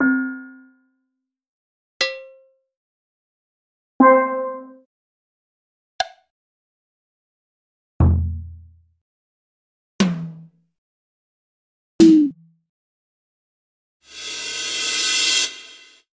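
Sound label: drums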